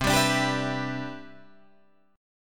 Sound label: C Major 9th, Guitar Drop D